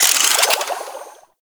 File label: Water Sprayer Alt Pump Load; Warframe; Weapons